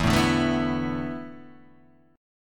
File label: E 7th Suspended 4th, Guitar, Drop D; chord shape